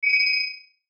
Sci Fi Beep Electric, Sci Fi Beep Electronic Tone Signal; Tech; Blastwave